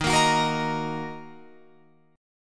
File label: D sharp Minor, Guitar, Open F; strummed